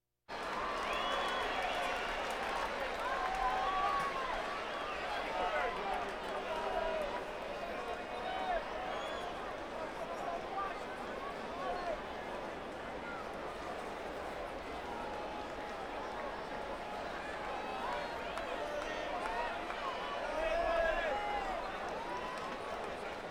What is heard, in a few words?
Crowds Race cars Baseball